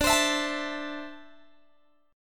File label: D 7th Suspended 2nd down Guitar Open G